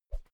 whoosh thin quick soft; swooshes-swishes

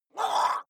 water monster female gargle shout attack short; koerpergeraeusche; comedy-cartoon; soundpool

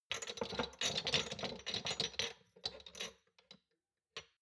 shot glasses rattle very soft, gastronomie; berufe; soundpool

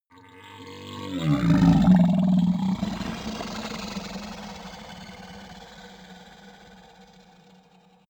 minotaur grunt strained; kreaturen

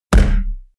heavy saturated, hits impacts; whooshes rises

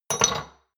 shot glasses stack hard; soundpool

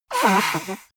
nose blow big; soundpool

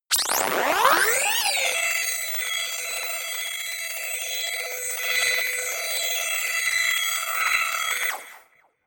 crackle scanner long; soundpool

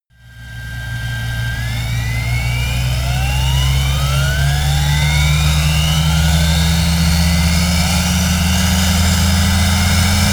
plane bombardier learjet turbine rev up; flugzeuge verkehrsflugzeuge; verkehr transport

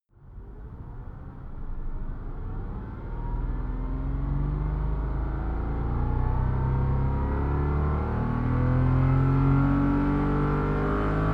sports car porsche boxster accelerate interior; verkehr transport; autos

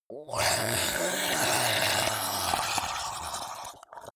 zombie grunt choke male slimy; kreaturen

